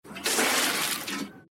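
splashing water out of bucket onto grass onto ground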